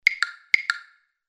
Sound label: Ebony, ringtone; Samsung SGH-F310 Serenata